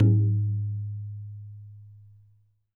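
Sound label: BANYA right; WorldSounds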